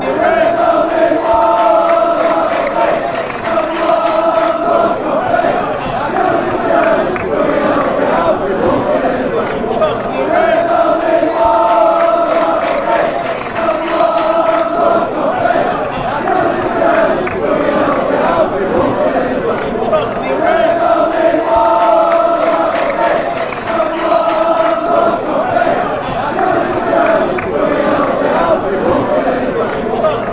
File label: song fanchants; mark duffy